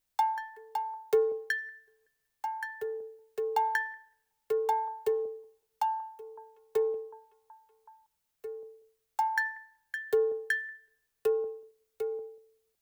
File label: frames Drum High Random